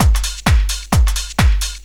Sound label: drum 130 trance; Techno Trance Essentials; DRUMLOOPS